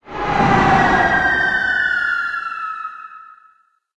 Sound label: car jump; SHaR